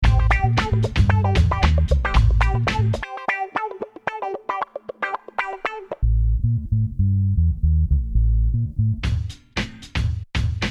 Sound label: Guitar; MonkeyMan Guitar Random Loops